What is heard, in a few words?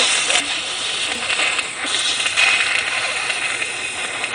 Mad Hissing Possum